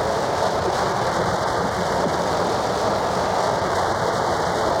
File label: Cracklature, sparse soundscape